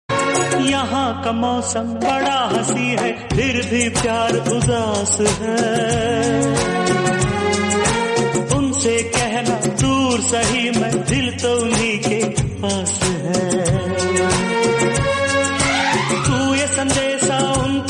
piano tone

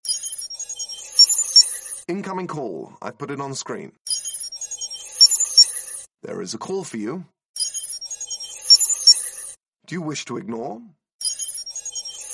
incoming call tone; jarvis

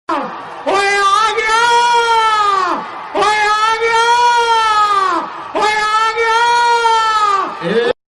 sad punjabi

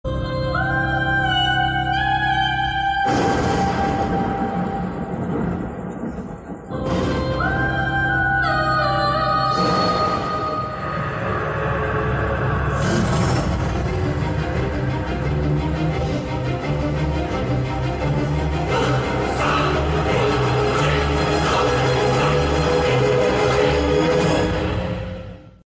horror background music; bhool bhulaiyaa 2

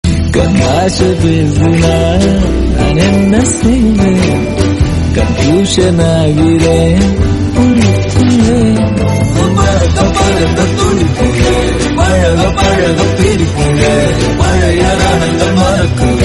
love trending songs tamil; thiruchitrambalam

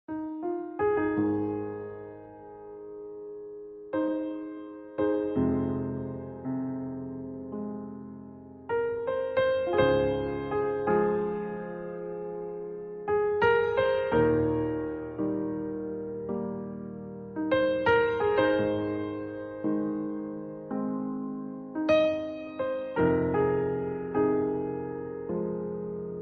piano cover

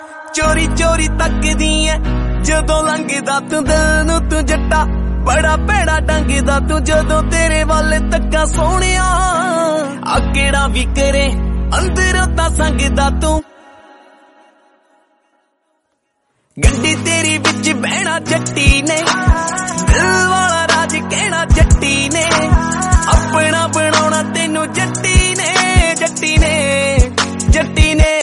new jatti song ringtone

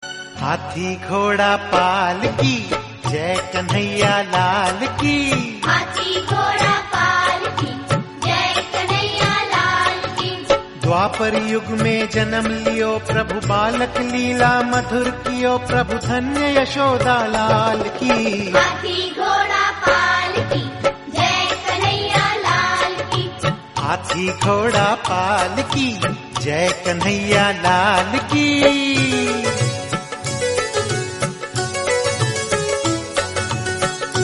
hathi ghoda palkhi, devotional, hindi

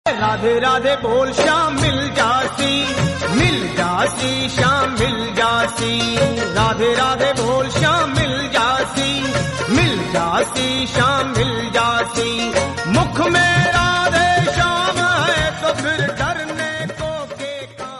devotional

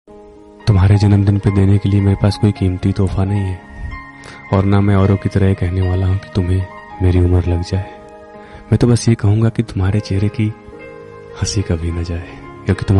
happy birthday, bday song english

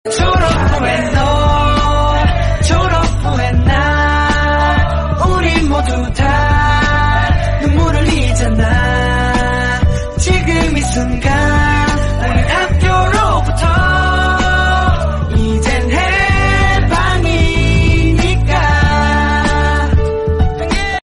korean song

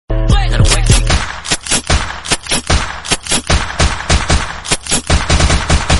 song Tamil

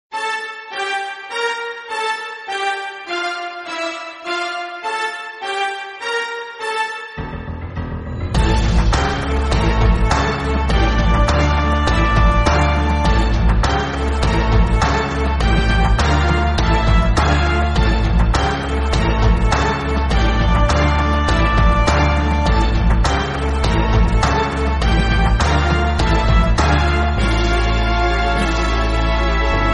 background music; Dragon Ball Z Ultra Instinct

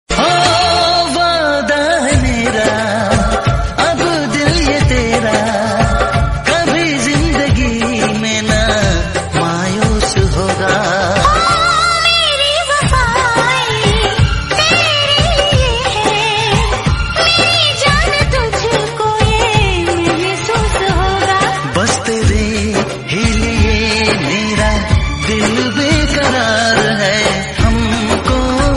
hindi romantic

